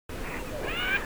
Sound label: wigeon, birdsong